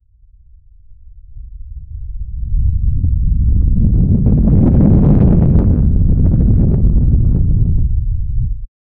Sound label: a deep rumbling thunderstorm rolling across the sky; dark